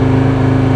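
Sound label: Engines low loop; SuperBike2001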